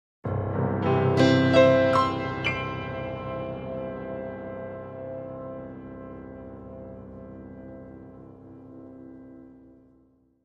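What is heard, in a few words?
Grand Piano Dramatic; keyboards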